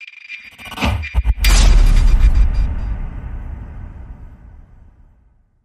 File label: Hit; Sttr Assault